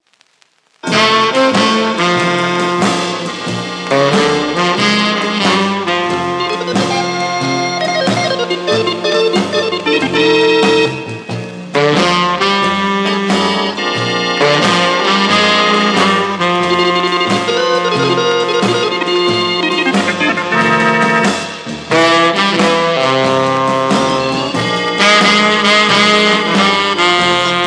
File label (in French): clavecin the blues; saint louis